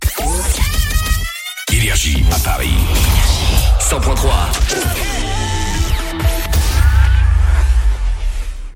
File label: JINGLE; PARIS, 100.3 FM; NRJ